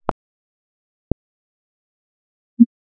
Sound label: 3 burst